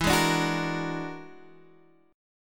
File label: E 9th Flat 5th E down Guitar Standard; E9b5 strummed; JGuitar